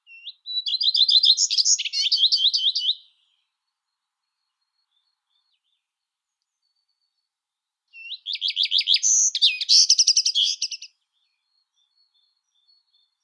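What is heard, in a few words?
common redstart, Phoenicurus phoenicurus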